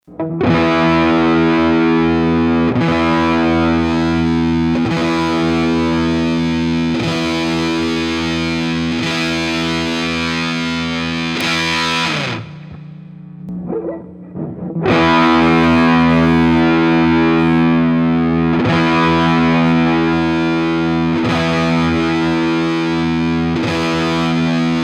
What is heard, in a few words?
V8 Russian Muff Bass Big Muff TONE RANGE